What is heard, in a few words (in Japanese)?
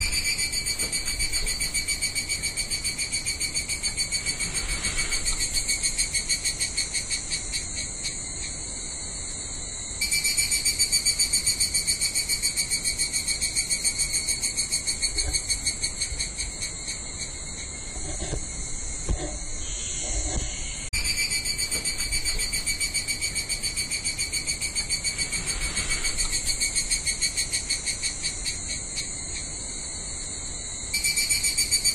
higurasi